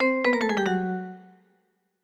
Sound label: Jingles Slowdown